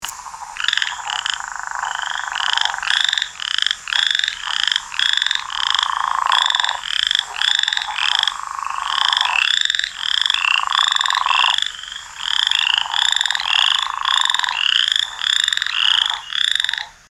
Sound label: leopard frogs croaking